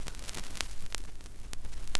SCRCH NOZ, Record Noise; AKAI S-Series CD-ROM Sound Library VOL-4

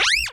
SQUEAKS, Anime Sound FX; COMIC

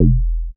bass classic thonk F; Polyend; ARC Noise

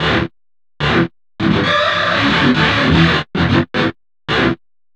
GTR LOOPS

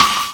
Ugly Acoustic, Snares; Drums

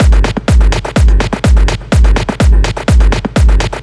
125 drum loops; Dipstick; M8; Electronisounds

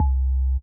kick, Roland MC-909

